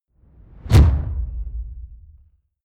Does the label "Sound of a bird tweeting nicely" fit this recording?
No